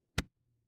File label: feedback achievement